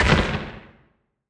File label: asteroid damage interstellar medium; Factorio